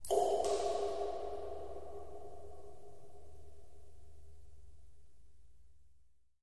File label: Echoey Cave Drips Wa